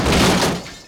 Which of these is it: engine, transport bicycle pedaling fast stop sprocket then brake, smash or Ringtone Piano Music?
smash